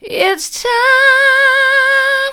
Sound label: it's time soft, vocal